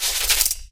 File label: cloth foley; Portal 2; Source Engine; video games; coop